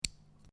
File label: Lego Two Lego Pieces Clack Together; Miscellaneous Toy Sound Effects; Lynne Music